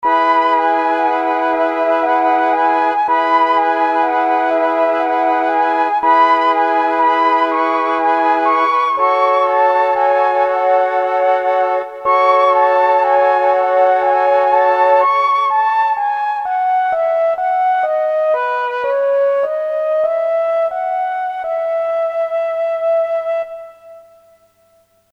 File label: FLUTES, Korg DSS-1; Flutes & Harp